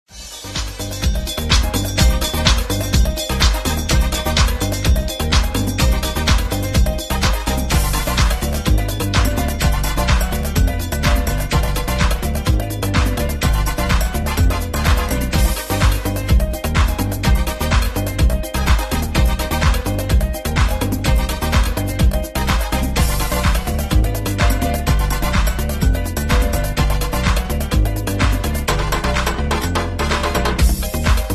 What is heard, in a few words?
Salsa Rhythms